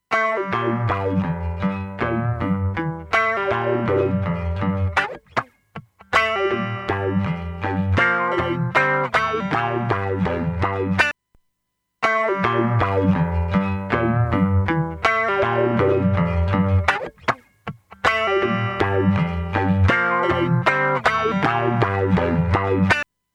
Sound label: FMR Audio RNLA7239 Guitar